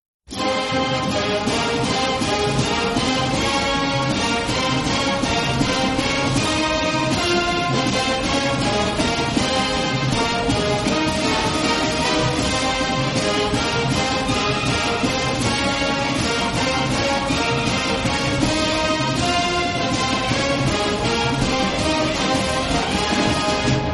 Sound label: fight song the victors no end cheering; university of michigan